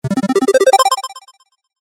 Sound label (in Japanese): 決定 低音 レトロ; モノラル